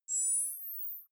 zoom out light high; kommunikation; signale

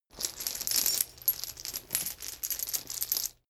metal chain pull up

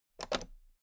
toolbox latch open regular

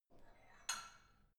barbell only lift up soft, fitnessstudio

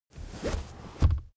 car armrest backseat open

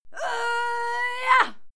female fight scream yah struggle; schreie; menschen

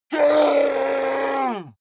yeti attack shout aggressive command; schreie

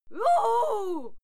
female cheer wuhu

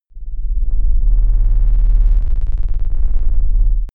alien dark deep tension; aliens

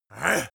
male attack growl

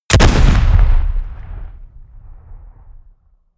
shot

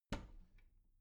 bathroom cabinet door open soft

